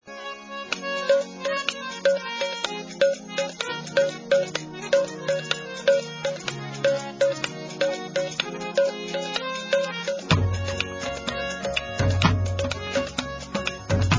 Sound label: music